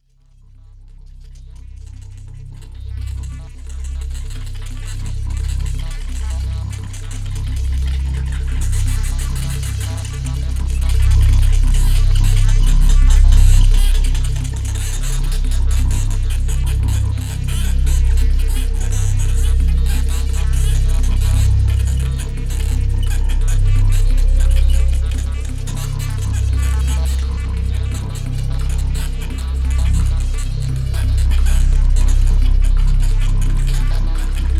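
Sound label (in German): Soundcollage, Klappern, Quietschen; Bizarre Planet Sampling CD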